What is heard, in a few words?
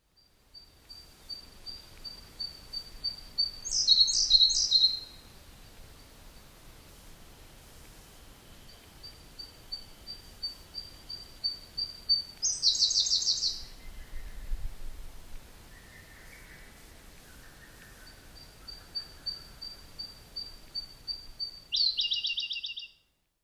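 Siberian Blue Robin; koe; osado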